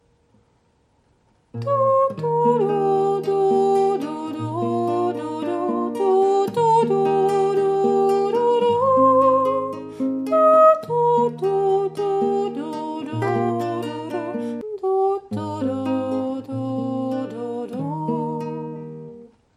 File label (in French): refrain fredonne